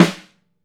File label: LOW TUNE, SNARES; Sampleheads - New York City Drumworks VOL-1